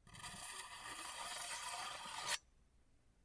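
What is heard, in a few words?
drawing a knife along; Звук ножа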